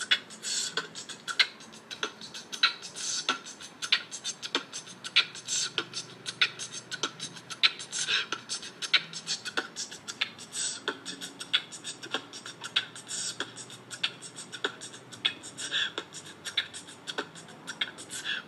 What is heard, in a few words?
beatbox